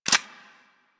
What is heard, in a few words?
M16 Mech End, rifle; Wraith